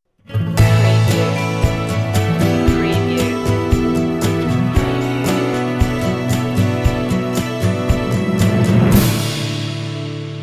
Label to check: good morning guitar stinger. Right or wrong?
Right